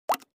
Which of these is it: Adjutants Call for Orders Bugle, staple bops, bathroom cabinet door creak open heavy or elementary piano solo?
staple bops